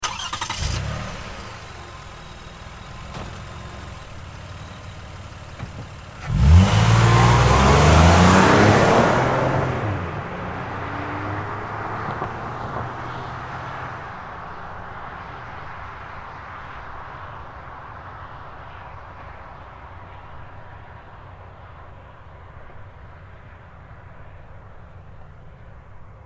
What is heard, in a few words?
Car Highlander Start Away Fast; 2005 Toyota Highlander V6; Cars; Vehicles